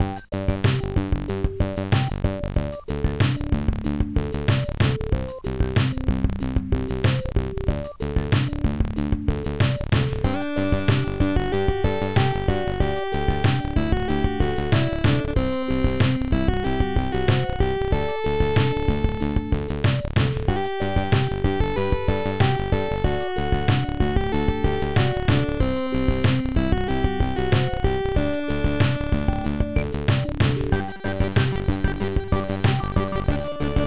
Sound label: keygen song